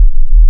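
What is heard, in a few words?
waveform FM sine sine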